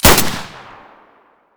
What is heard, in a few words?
m9a3 muzzle stereo; beretta